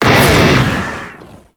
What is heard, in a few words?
Lizzie Fire Shot Loop Start; Warframe; weapons